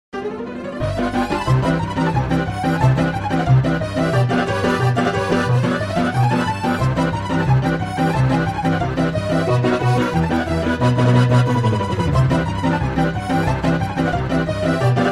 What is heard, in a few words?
circus music